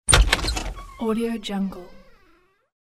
door open

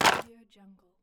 board game plastic pieces drop

